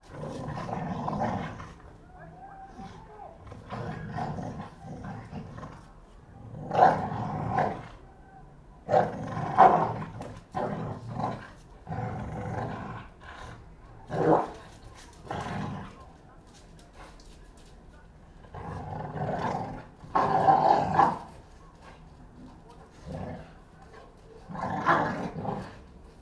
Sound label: dogs Pit bull fight; Tessa; Bahko; NS Studios - ultra sound collection; animals